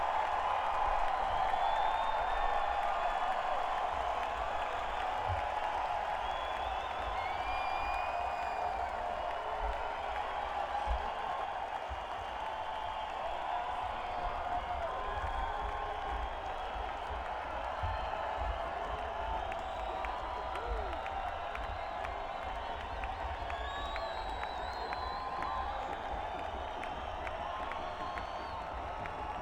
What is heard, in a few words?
encore break